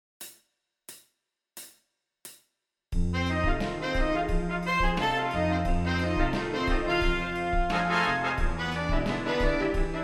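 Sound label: Lip Slur Horns in Fifths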